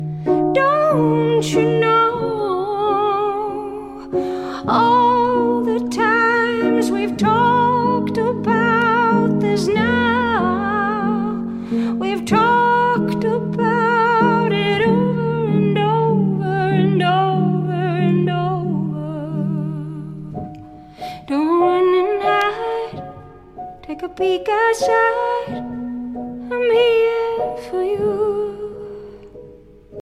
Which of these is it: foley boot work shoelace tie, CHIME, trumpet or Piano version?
Piano version